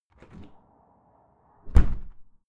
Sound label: window open close soft; objekte; fenster